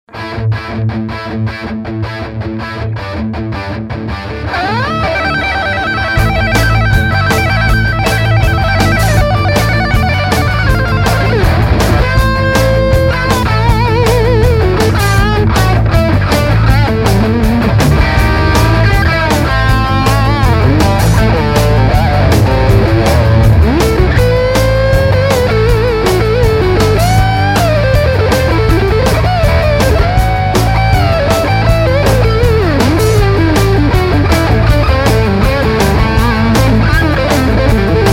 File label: Les Paul Lead; Maranello